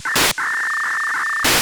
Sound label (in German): diskdaten